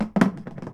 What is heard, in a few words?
plastic barrel soft; Dystopia; physics